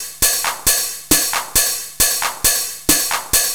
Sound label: Hi-Hat Loop, Drums, Dance Beats